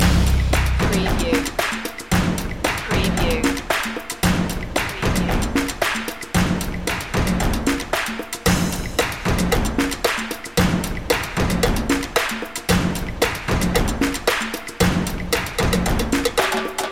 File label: rhytmic soul loop